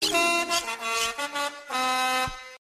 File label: Horn